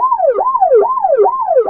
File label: Electro FX; Ueb HE Electro FX